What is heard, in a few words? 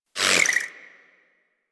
Pistol Proj By; Warframe; Tenno; Guandao